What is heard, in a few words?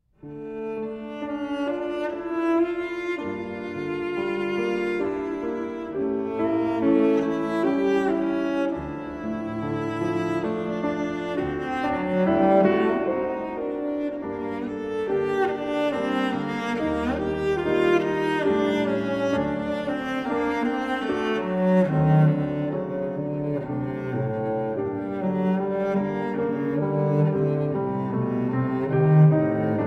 Cello Sonata